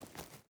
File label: footstep burlap forest walk; Rust